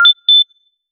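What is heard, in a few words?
beep for diagnosis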